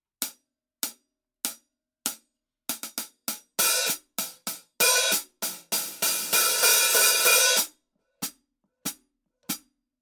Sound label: formula 602 classic sounds 14 heavy hi-hat pattern; paiste